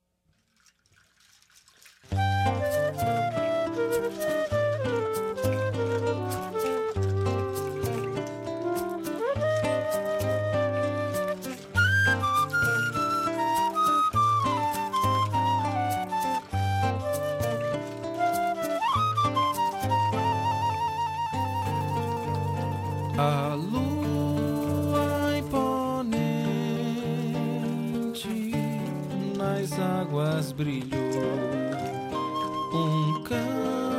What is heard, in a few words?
sax flauta